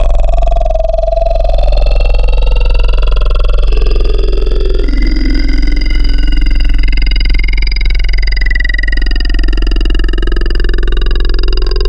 crickets

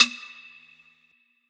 perc; eddyflux

